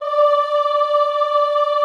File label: OOH D4, Classical Choir; Best Service; Extended Classical Choir